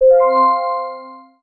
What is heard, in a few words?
Match small